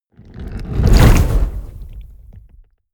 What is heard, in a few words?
whoosh fire lava wave medium